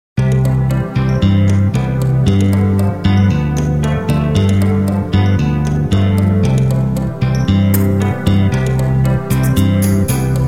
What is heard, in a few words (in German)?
country traummelodien